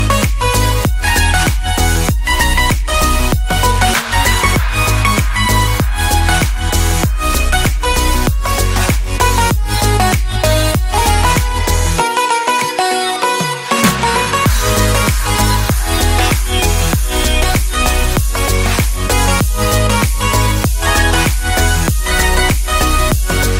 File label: english sweet music ringtone